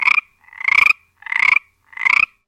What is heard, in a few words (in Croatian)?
zaba, animals